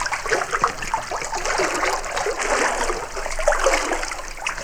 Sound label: drain water slosh